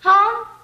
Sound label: huh female; ooh ahh; heh huh